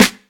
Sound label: dry acoustic snare sound g key, steel snare drum sound tuned to the G note